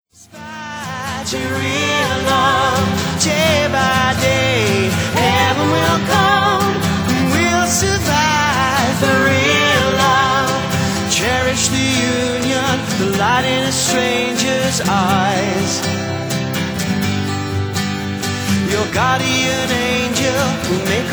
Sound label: Acoustic Session